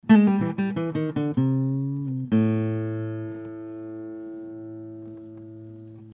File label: Guitar Bites